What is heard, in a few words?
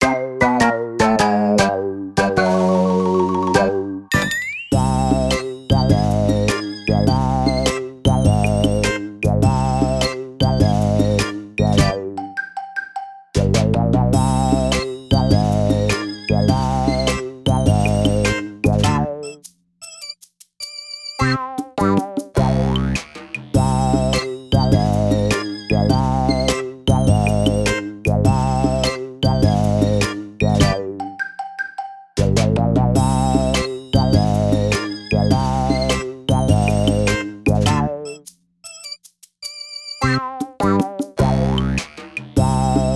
bike low; Yokai Watch 2